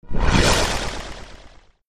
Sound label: Vortex